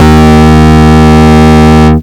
Root D#, Bass

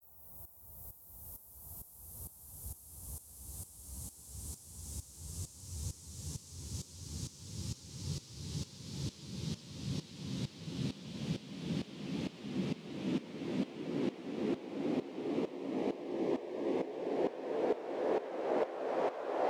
132BPM Long Sweep; G#major; Sound FX

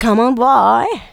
vocal house adlibs; PS-28 Vocal House Samples

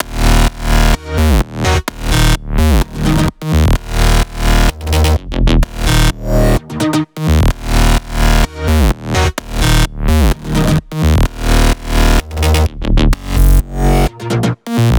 128BPM Melody Root D#; Vapour; Vengeance Samples; Vapour Kit

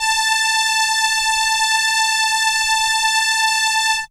Viola A5, synth, ARP Solina; musicradar-synth-samples

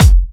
Hard Kicks; Vengeance Samples

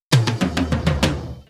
Fill Ins 128BPM; Vengeance Samples; VEH2 Loops

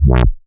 Synth Bass; Vengeance Samples; VEE Synth Shots

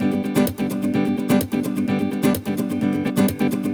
Nylon Guitar G maj, 128BPM; Vengeance Samples; VEH3 Nylon Guitar Kit 1

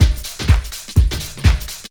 drum loop 125, front of house, vocal house; PS-28 Vocal House Samples; drumloops

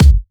129BPM Hiphouse Kick; VFH1 129BPM Hiphouse Kit

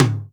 Percussion; Vengeance Samples

